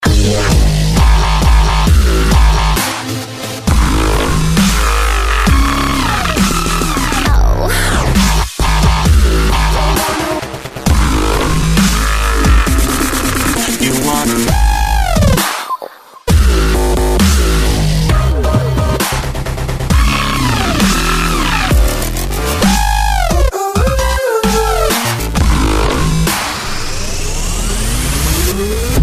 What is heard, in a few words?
crazy dubstep beat